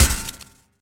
star award; Ben 10 - Hero Time Game; ThrillGames

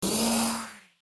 twister; Looney Tunes - Avalanche Snowboarding

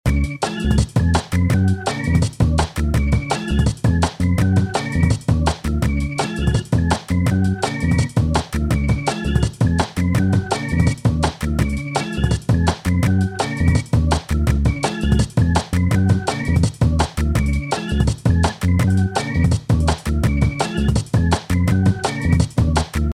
music splash; Nickelodeon - Back to School Search

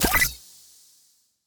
ui play; Ben 10 - Spot The Difference

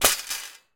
stand drop metal; Beano - JJ's Wheelie Big Challenge

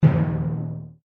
fire drum; Adventure Time - Elemental; ThrillGames

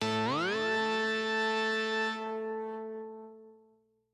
chapter complete

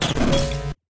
hit; Adventure Time - Angry Betty

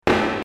explosion; Mr. Bean - Backyard Junk

